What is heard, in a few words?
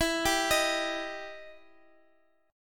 E Minor Major 7th E down